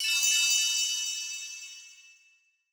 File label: spear sparkle; boss; gynthar